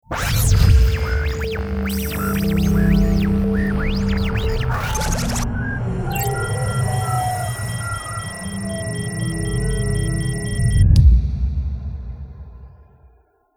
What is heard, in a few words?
TECH LOGO